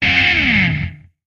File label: Music E Guitar Disto